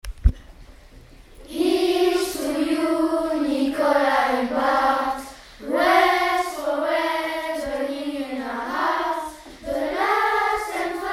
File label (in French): chant anglais; enregistrement des élèves avec un chant en anglais; chant anglais CM1CM2